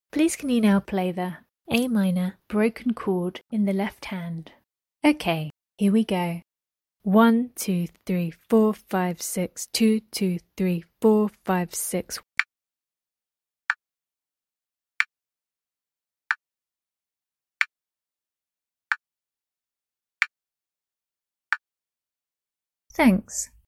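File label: a minor broken chord lh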